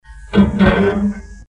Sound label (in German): DRING